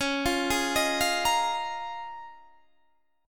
C sharp 6th Add 9th C sharp down; C#6add9; arpeggiated

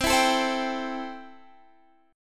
C 6th, C, down, Guitar, Standard; C6 strummed

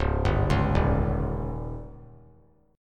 Db Suspended 2nd Flat 5th; arpeggiated; JGuitar

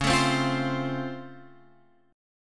C sharp Augmented Major 7th down, guitar chord

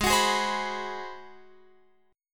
G sharp 7th Sharp 9th, Guitar, Open F; G#7#9; x,15,18,15,15,18; strummed

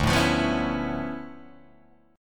D Minor Major 13th, down, Guitar, DADGAD; DmM13; strummed

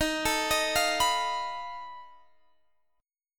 B 7th Flat 5th D sharp, guitar chord

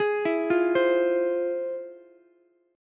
F Minor Major 7th, Ukulele, chord; FmM7 arpeggiated; JGuitar

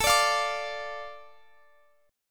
A Suspended 4th Sharp 5th, Guitar Open F; Asus4#5; strummed; x,x,21,21,20,21

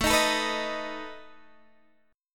B 7th A

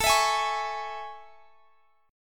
A Major 7th Guitar Half Step Down

